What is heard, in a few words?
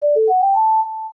Silver Rupee; zelda; OOT